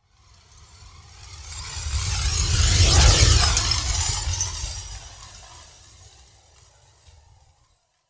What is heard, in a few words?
Flyby Through Glass